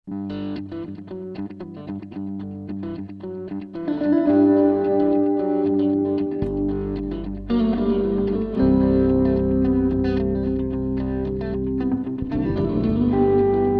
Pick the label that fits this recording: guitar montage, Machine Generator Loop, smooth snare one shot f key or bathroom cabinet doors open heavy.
guitar montage